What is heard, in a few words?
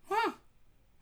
wah; abstract; Free Sound Assets